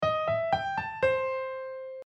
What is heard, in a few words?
maj pen